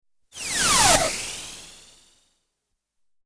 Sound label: Missile ricochets left to right; Cannon; BBC